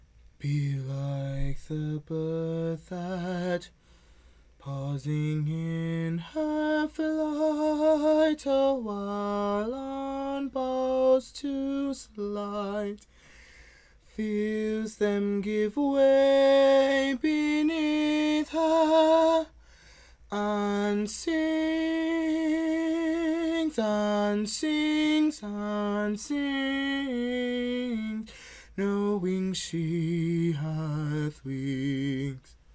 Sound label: Be Like a Bird Male High